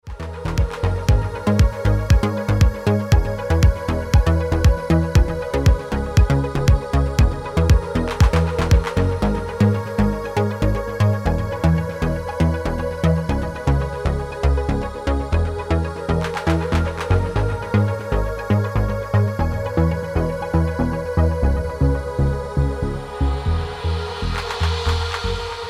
Ringtone

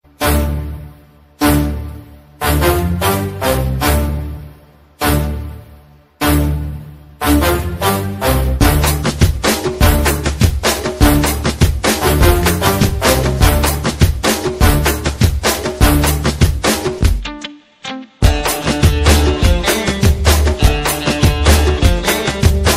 Mexican Ringtone

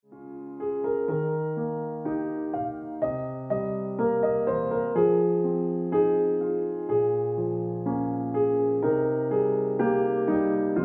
Ringtone Piano Music

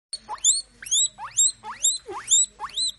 Hamster Squeaking Ringtone

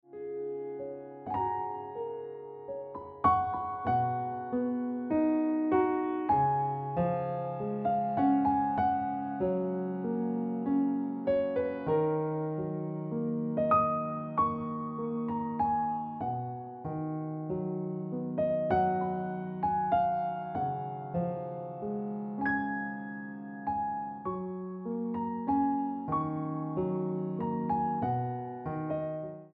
Ringtone Piano Music

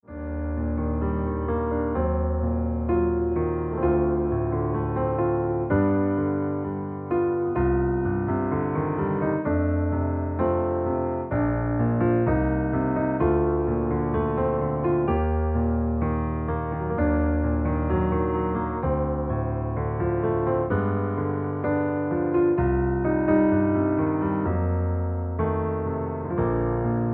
Piano Music

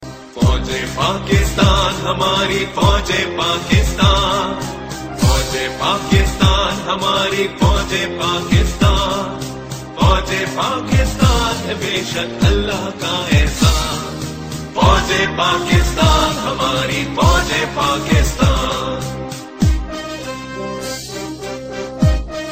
Pakistan Army Pakistani Ringtone

